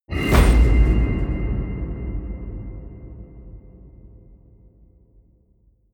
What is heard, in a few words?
metal crash hollow ring out small